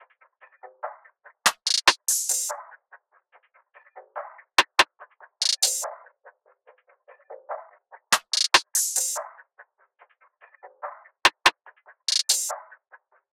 Perc Loop - 144 BPM, trap; Drum Loops; Learn The Ropes Perc Loop; Cymatics; Trap Starter Pack

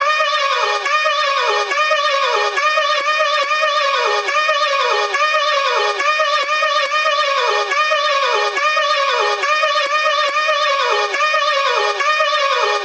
140 BPM F# Min Vocal, Speed House; Cymatics; GHOST (Beta Pack); Annihilate

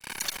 Glitch

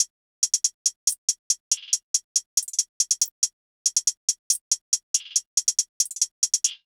Vapor Hihat Loop - 140 BPM

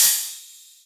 Ride, Dubstep Drum Shots; Rides & Crash; Demonic Ride; GhostHack; Ghosthack - Demolition - Free Dubstep Samples

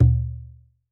Bobobo - D#; Percussion; Cymatics - Infinity Beta Pack 2.0; Zeus Percussion Collection

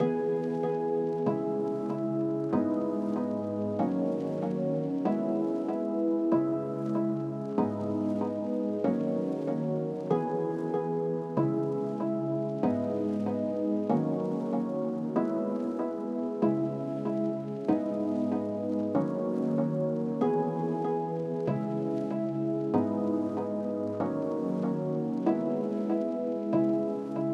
95 BPM B Min Keys, Latin; Melodies; Loop Stems; Cymatics; GHOST (Beta Pack); Aventador

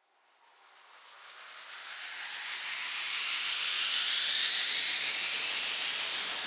Tension White Noise Up - 128 BPM; FX; House

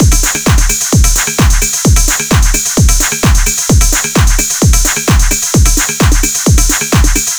Drum Kit, Full, 130, Techno; Drums; Ghosthack; Drum Kit 001; 130BPM

